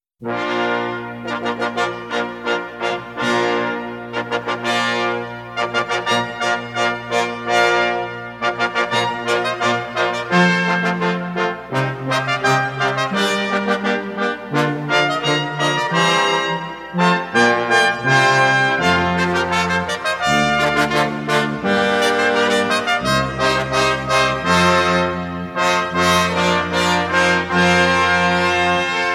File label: Fanfare, Brass Choir; Buxtehude